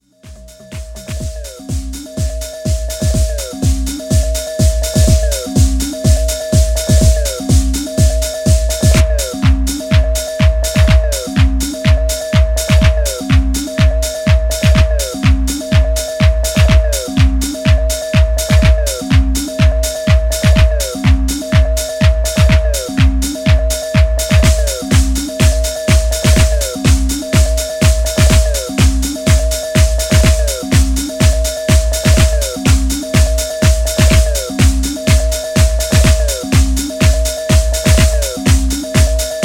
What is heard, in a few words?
circus bells; house